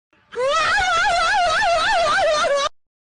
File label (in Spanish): risas